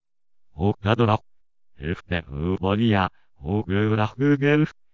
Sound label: music